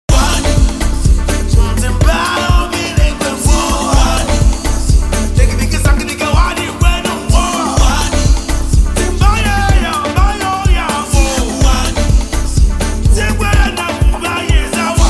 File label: drums